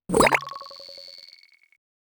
Death

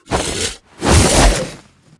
Enemy Woodsman Attack

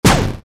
Character Fire

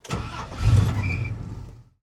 mecha powerup; unsighted

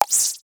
Jump

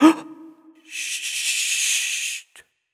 Shutter; Chutt

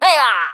Pecheneg Archer Attack

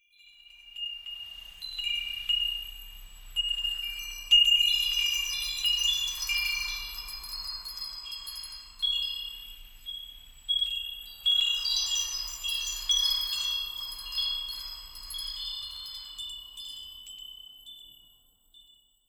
Chimes

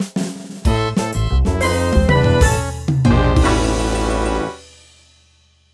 music jingles jingle; no doncky; Donkey Kong Country Returns HD

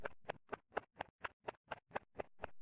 Wheel Reverse Loop

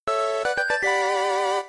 Jingle Blue Witch

